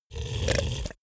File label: monster snoring short